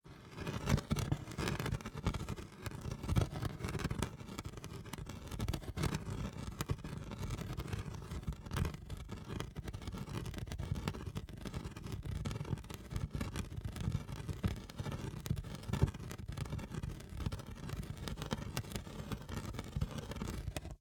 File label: Grit, medium, hard surface, mortar, rolling, circles; Eiravaein Sound